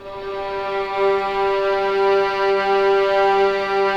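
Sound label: violins; String Master Series; Vlns 6 p-mf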